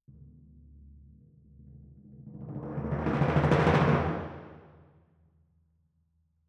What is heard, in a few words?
Timp Main